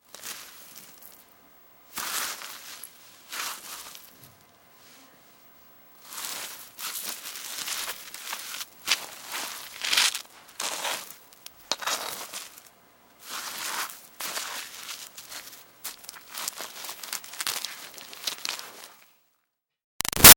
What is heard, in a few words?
Foley Moves Dirt Gritty Smear; Debris; Materials